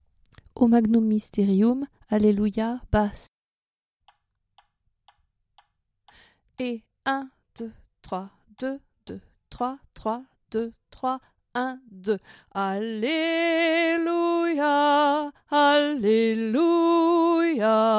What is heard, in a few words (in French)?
Basse; O Magnum Mysterium Alleluia